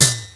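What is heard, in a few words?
snare sfx, Yamaha Motif ES-Series; 300 Drum Machines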